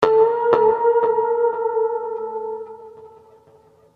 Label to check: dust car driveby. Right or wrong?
Wrong